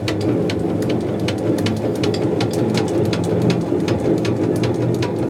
turret; World of Tanks

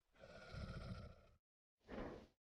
sleeping; Rust

